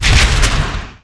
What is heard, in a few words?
dragon tail